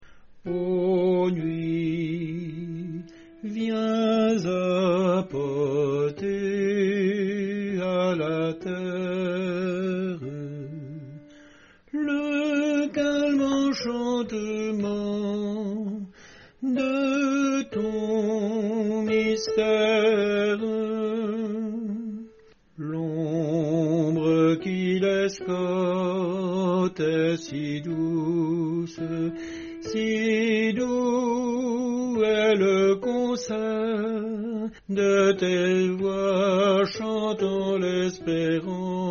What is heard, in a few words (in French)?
soprano